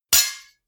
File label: knives large scrape hit; whooshes rises